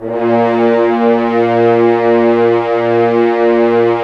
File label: Horns; Roland - LA Composer vol. 4